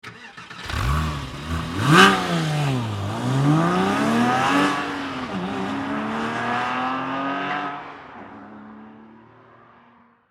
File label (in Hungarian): indítás gyorsítás, porsche 911; Porsche 911 Cabriolet; 2. Indítás-gyorsítás